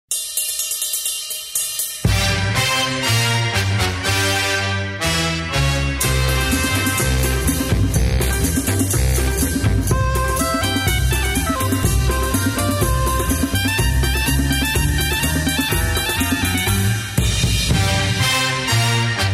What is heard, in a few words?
swinging cymbal